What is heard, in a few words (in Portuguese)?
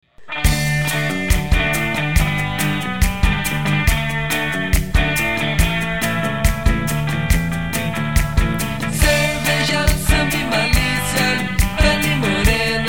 samba